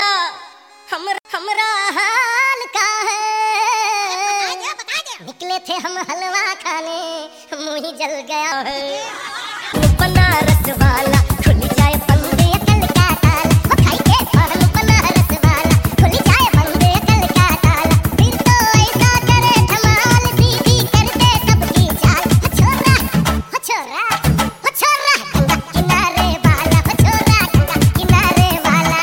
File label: Banaras Remix